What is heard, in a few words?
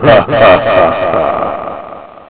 HU HU HO HA